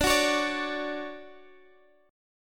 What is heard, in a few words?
D-Major 7th, D, Guitar, Open G